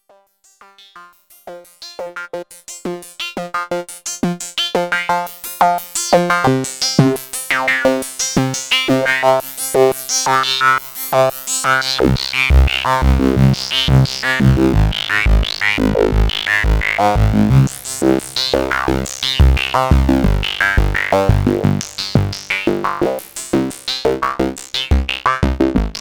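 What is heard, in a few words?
MiniBrute Sequence VCF mod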